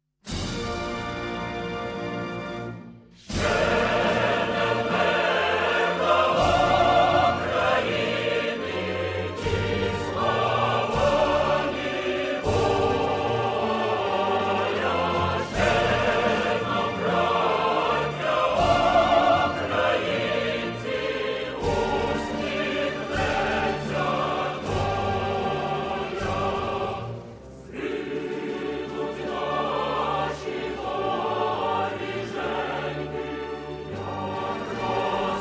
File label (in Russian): Gosudarstvennyjj gimn Ukrainy; Gimny